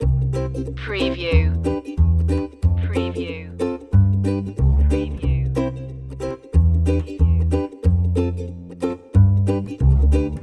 reggae happy loop

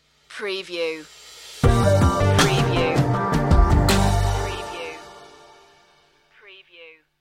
quietly confident stinger

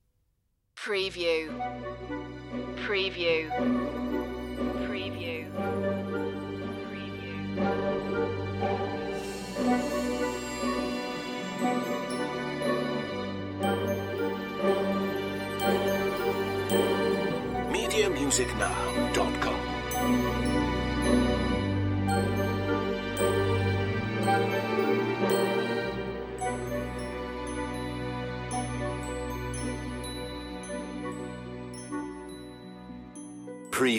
orchestral mysterious cue

